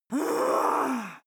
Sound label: female groan angry; koerpergeraeusche; menschen; soundpool